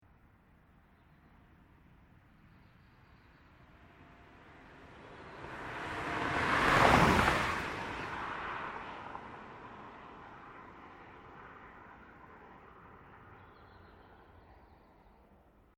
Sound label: car